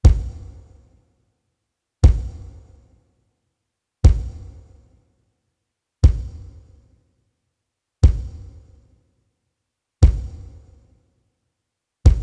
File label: bass drum